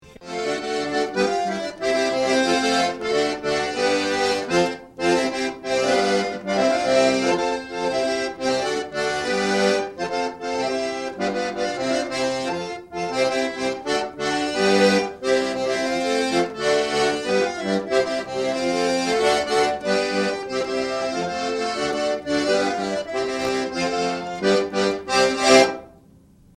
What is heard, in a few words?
Polka; Leopard Suit